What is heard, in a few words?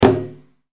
dundunba